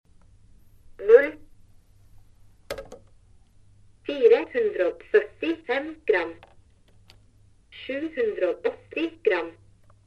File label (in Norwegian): Kjokkenvekt; Cobolt